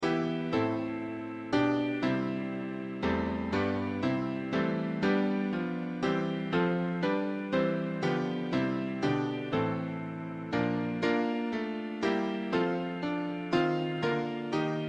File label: Piano